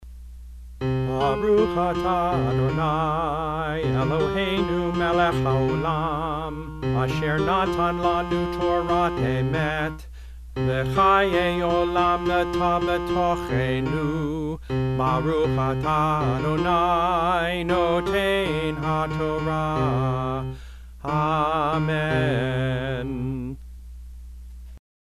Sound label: piano voice